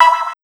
hit, big beat drumloops; USB Soundscan vol.25; AKAI; DJ 130

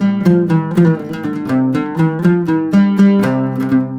120 LUTH 1, Arabian Traditions; USB Soundscan vol.43; AKAI

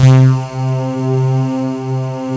SIGH C3; Big Fish Audio; Synth City; COMPOSITE

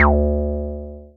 Synths BASS

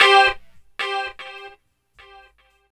GTR

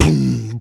Beatbox Kick, Human Beatbox; Vengeance - Essential Clubsounds Vol.2; Special Sounds

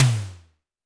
TD-20 Tom A, Roland TD-20 Toms

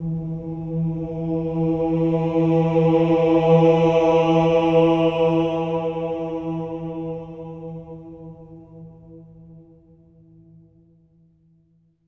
OH E3, Symphony of Voices, SWELL OHS; Spectrasonics

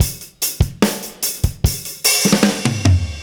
Beat Loops; Zero-G; Monster Beats

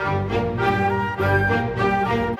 Orchestra; Sonic Foundry (Sony Creative Software); Orchestral VOL-2; Modern 32

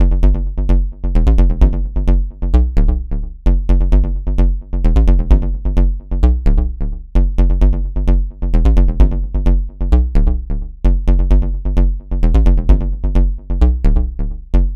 NRG Bass, Trance; Sonic Foundry (Sony Creative Software); Trance NRG VOL-1

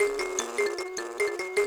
Buzz Kalimba; Roland LCDP11 Africa VOL-1

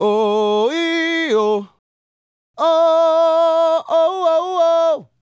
Male Solo, Hip-Hop and RnB Vocals

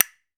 CASTANET

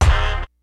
HIPHOP HORNZ; Kid Nepro - Elite

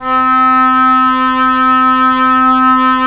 WND OB P, Oboe p; Roland - LA Composer vol. 4

